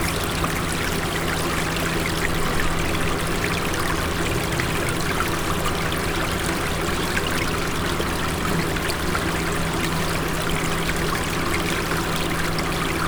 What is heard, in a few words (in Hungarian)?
út melletti árokban áramló víz